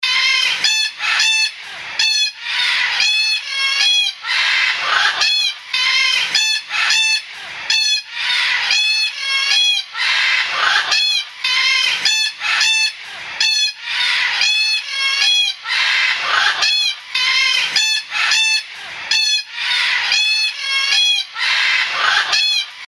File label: Red Tailed Black Cockatoo, Parrot Calls